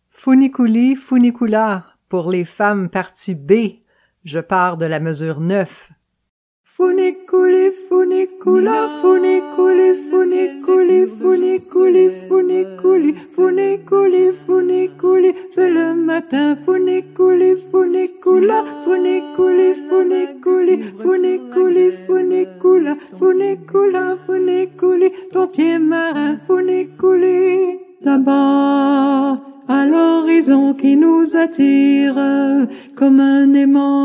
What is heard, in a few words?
Funiculi Funicula Femmes B, chorale